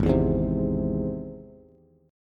Ab Augmented Ab Bass; Ab+ strummed; JGuitar

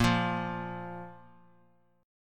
Bb 5th Bb; Bb5 strummed

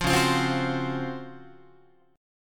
Db Major 11th down Guitar; strummed; JGuitar

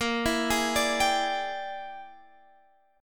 E 7th Sharp 9th Flat 5th A sharp, guitar

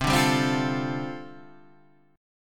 G 7th Suspended 2nd Suspended 4th C chord

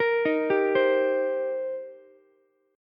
C Minor 7th C Ukulele; Cm7 arpeggiated; JGuitar

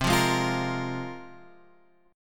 C 7th Suspended 4th Sharp 5th, C

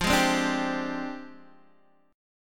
F Minor 6th Add 9th F down Guitar DADGAD

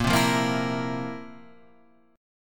Bb Suspended 2nd Sharp 11th, chord; Bbsus2#11; strummed; JGuitar

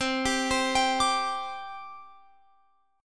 C Suspended 2nd, C, down, Guitar, Open F; Csus2; arpeggiated

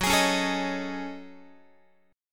F sharp Minor 6th Add 9th, Guitar; F#m6add9 strummed; x,14,11,11,13,14

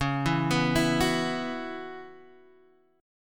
C sharp Minor 11th C sharp; C#m11 Chord {X 4 2 4 5 2} arpeggiated

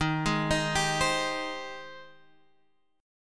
Ab Major 7th Eb

